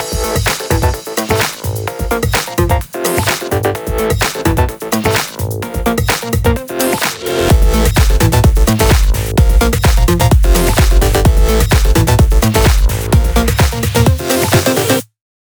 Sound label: 128BPM Mix; Vengeance Samples; VFH1 128BPM Fury Kit